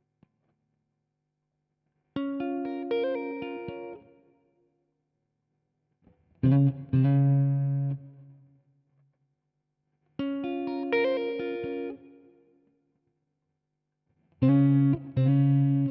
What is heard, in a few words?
Guitar Loop 120BPM A#m, chords & melodies; 99Sounds; Monosounds; Music Loops; Instrument Loops; Guitars